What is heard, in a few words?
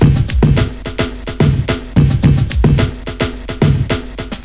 breakbeat; instruments, beats; World of Sound